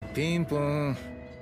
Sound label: Ding Ding; Garou